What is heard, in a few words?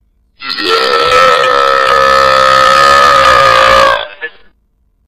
Mega Burp